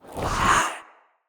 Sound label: Staff Caster Cast Long; Weapons; Player; Staves; Wazzard Staff Caster